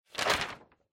Calendar Flip

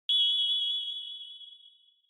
Flash High Dry; Onoma; オノマトペ 光る; 高 ドライ

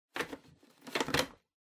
Vacuum Cleaner Paper Bag Insert

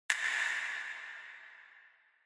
Finger Snap Foley Reverb

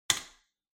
Light Switch Wide Panel, Hall Hard

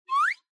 Anime Motion Low; アニメモーション; 低

